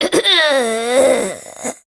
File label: Death; Warframe; Corpus Lich; Persona D